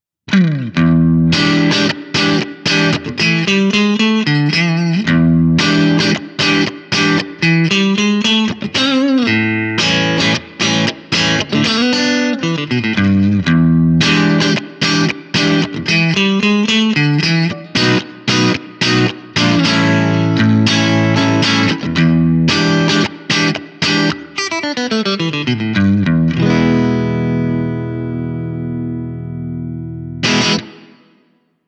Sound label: breakup; jensentone